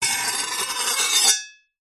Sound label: knifes medium scrape blades together